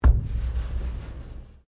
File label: METAL IMPACT RATTLE; Materials; Foundation